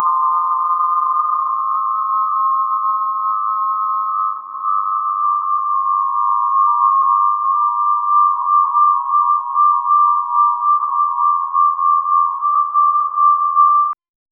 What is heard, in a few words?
hypnotic spiral be carefu, meditation